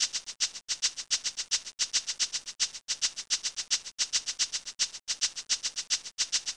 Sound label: SAMBA